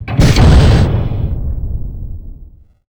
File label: biomech rocket start